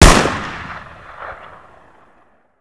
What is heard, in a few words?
45 Revolver Fire; Weapons